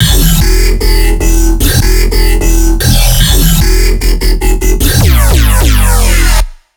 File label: E 150 Cruncher, Dubstep Bass Loops